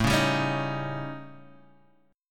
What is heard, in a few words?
A 7th Flat 5th; A7b5 strummed; JGuitar